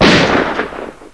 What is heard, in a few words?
pistol; KABOOM! - Wild and Wacky Sound Software